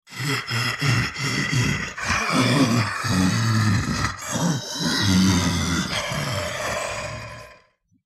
zombie breating

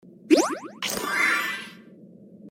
heal sound; dandys world

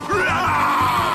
scream; flippy

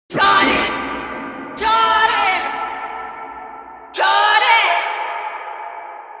ultra echo

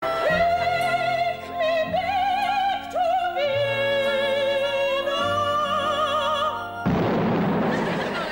fat lady sings opera and explodes

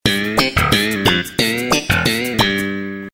sitcom transition bass riff; seinfeld

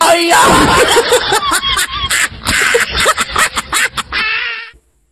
radio station laugh effects; fm

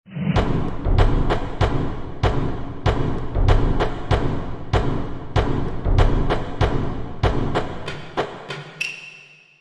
tribal drum

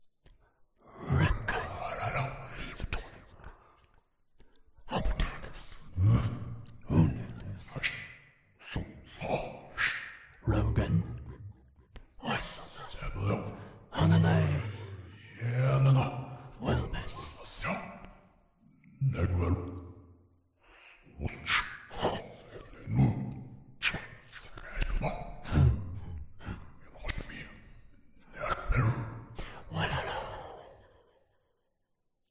soundscapes

